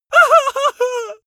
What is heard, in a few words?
male afraid whimper